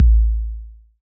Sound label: 808 & 909 Kit BD; Roland Juno-D